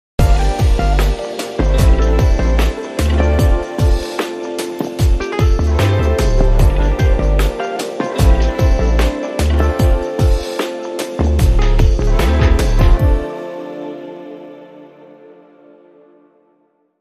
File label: Deluxe Keys